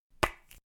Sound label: Cap Down, Place object on other object with a clack type sound.; Set Downs; Objects